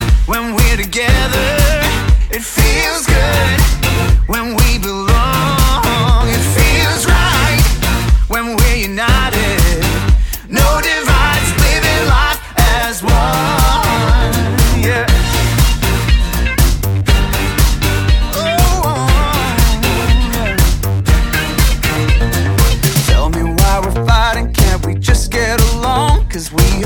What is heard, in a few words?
Upbeat Song